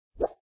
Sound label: drop all